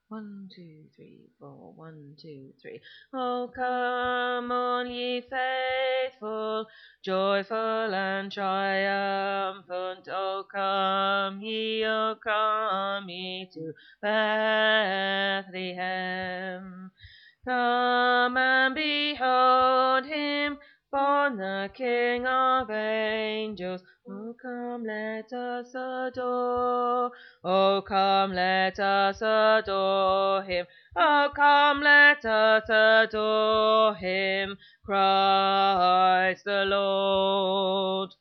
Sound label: Tenor